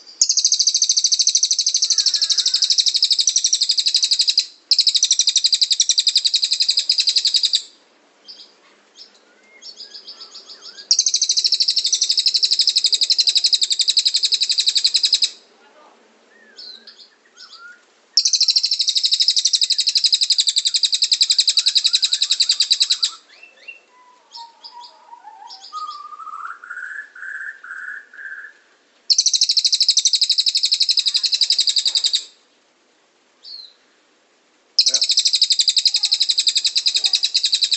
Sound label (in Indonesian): suara burung cililin asli jernih